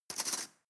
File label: cutlery teaspoon drawer grab hard